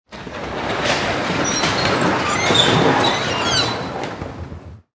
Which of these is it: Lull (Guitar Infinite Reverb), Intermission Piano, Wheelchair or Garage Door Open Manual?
Garage Door Open Manual